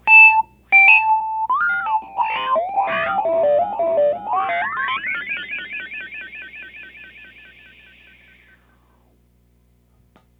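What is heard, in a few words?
RHODES FUZZ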